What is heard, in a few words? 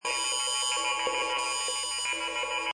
Space Scifi Sound Klax, Sci-fi Klaxon, alarm onboard spacecraft; Space Scifi sound - Klax: Sci-fi Klaxon, alarm onboard spacecraft or in futuristic science lab. Distress signal, emerge